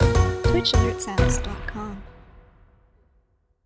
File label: Simple Subscriber